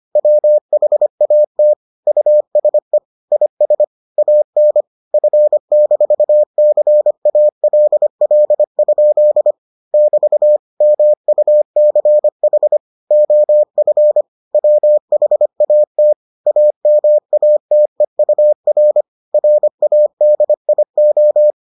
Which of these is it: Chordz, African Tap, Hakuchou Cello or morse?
morse